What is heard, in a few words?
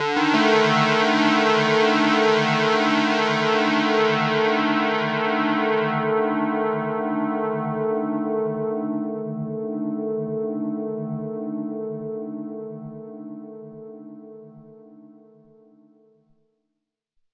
Zap Chord, Pads; Drum n Bass; KJ Sawka; Drum n Bass & Beyond